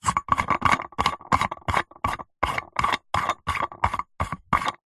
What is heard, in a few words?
household lightbulb screw turns plastic lamp; zapsplat